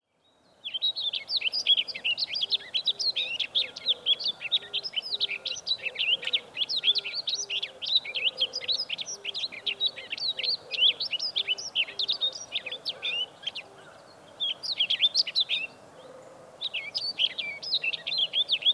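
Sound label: Rustic Bunting; kashiradaka; ashoro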